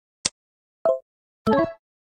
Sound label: popups, slot; gegenie pop; origins; mobile